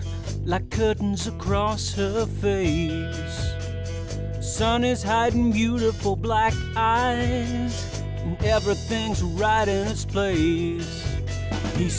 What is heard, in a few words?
noisy, vocal mix